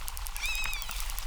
Trembling, acquarium

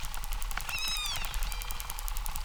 Trembling chirp, acquarium

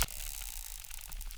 Zap, acquarium

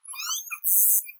Chirp zap chirp

